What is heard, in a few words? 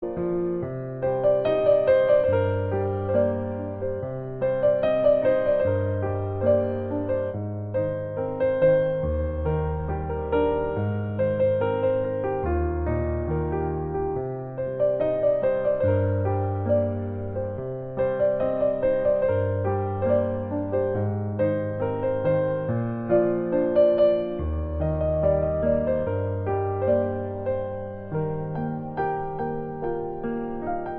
piano bgm; love today